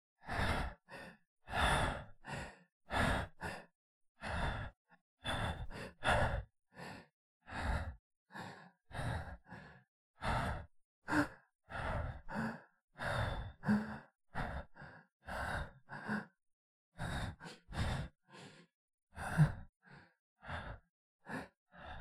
victim idle breathing

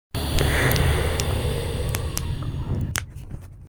skeleton sfx

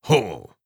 effort grunt male

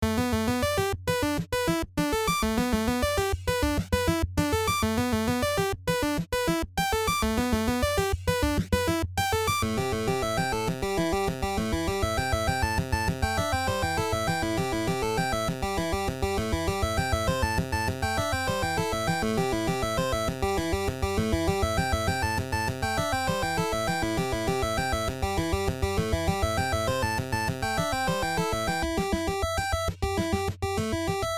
fire breathing turtle boss

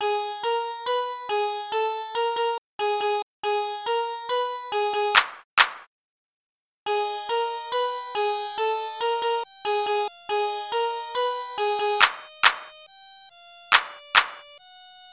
Possible Dungeon; This beat could pass as a SNES style dungeon, possibly desert?

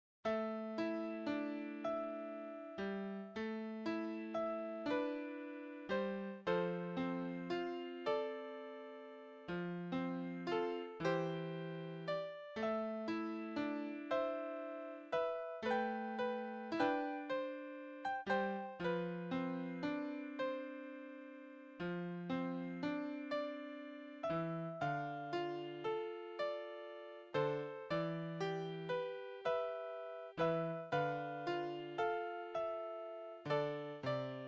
piano melody solo

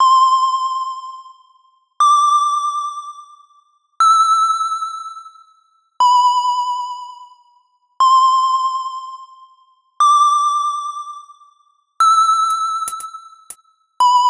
Chiptune

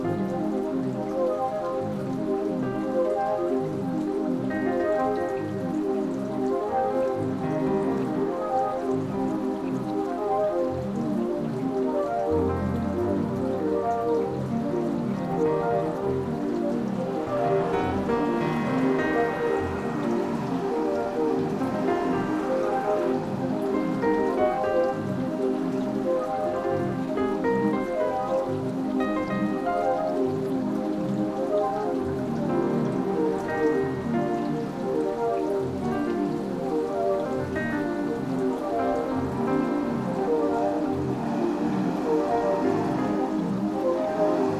smoking cig, Rainy Jazz & Traffic

